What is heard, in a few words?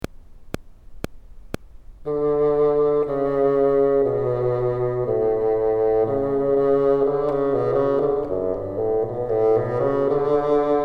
bottom, bassoon